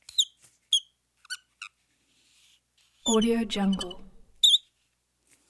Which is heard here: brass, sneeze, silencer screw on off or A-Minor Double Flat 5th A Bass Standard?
silencer screw on off